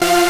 synth; osu

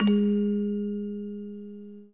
orgel

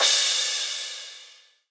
crush

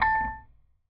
Bell A3

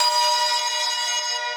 synth main

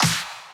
normal hit clap

